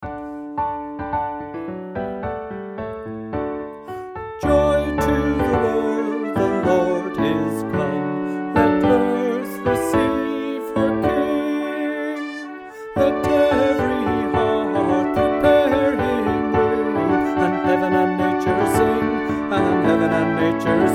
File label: Youth Choir; Joy To The World